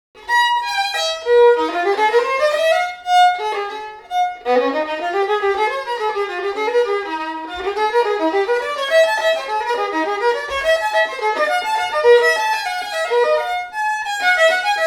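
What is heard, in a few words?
natural scale tuning, violin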